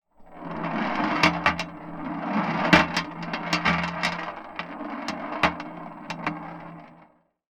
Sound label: Tin Tray, Marbles, contact mic; musicradar; contact mic madness; Raw